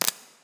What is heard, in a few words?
substation oneshot; nuke